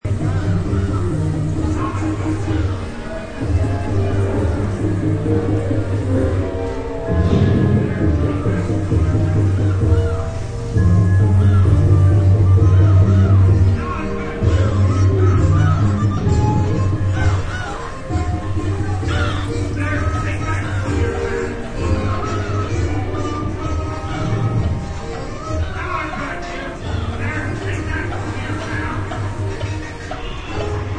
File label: Kids Ride, Sounds of crowd and music on a kid's amusement park ride.; Ride Sound Effects; Sound Effects:Games